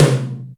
Night M, Acoustic Tom; Yamaha DTXpress

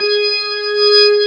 B3 G#4, R; Best Service; Advanced Media Trax Modern Composer; B3 SMALL1

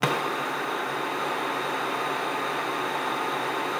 luft, alto sax; Xsample VOL-2

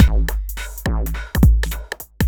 shuffle 808 breakdown; Sonic Foundry (Sony Creative Software); Ugly Remnants VOL-2

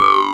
talk box boom

